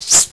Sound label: spark